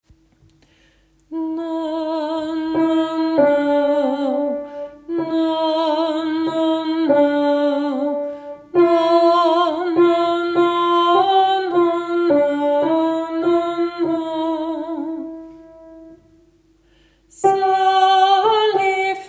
sopran; Glade jul vers 4; åfjord

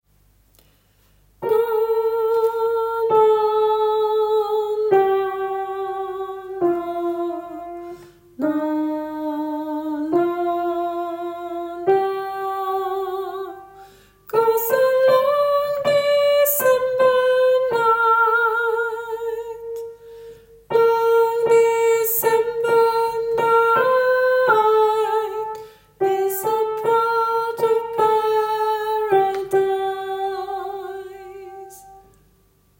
sopran; åfjord

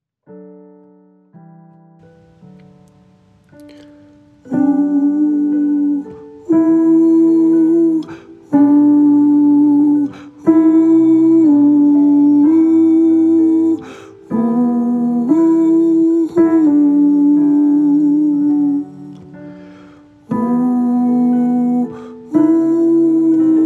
salme sommerro - alt; nedre eiker; kirkekoret

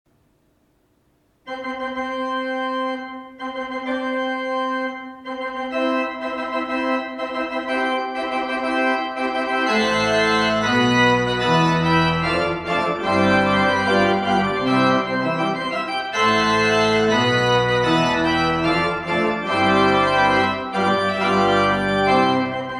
bryllupsmarsj; mendehlson; vestre toten

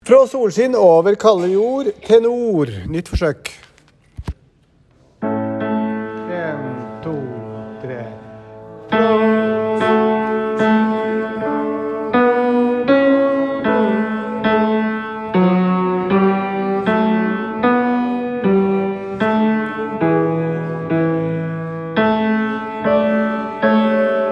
frå solkin over kalde jord - tenor, kirkekoret; salmekveld

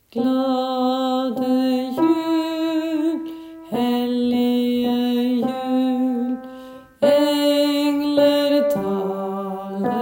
bass; Glade jul vers 1+2; åfjord

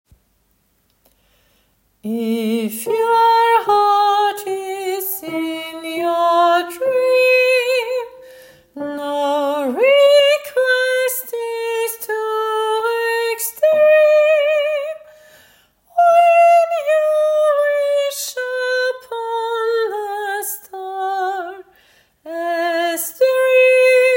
sopran; åfjord

